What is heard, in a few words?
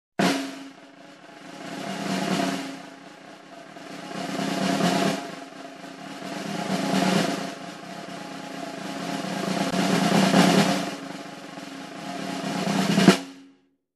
Long snare roll sound effect